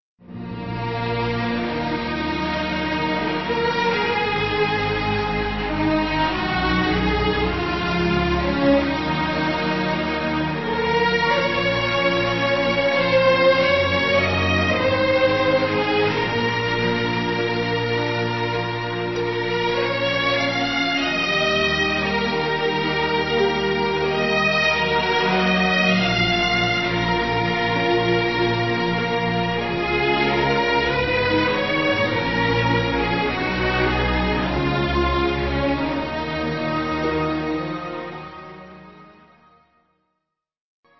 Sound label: instrumental